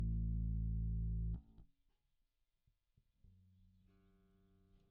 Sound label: Bass DI